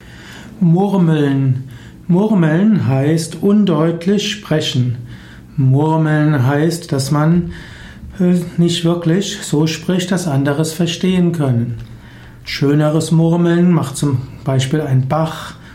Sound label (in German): Murmeln